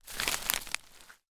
foley footstep single twigs dried leaves forest floor